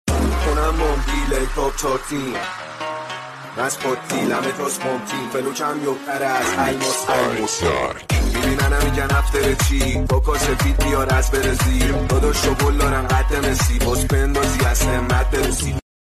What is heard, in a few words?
rap dark 128